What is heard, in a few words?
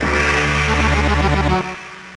Jingle Race Timeout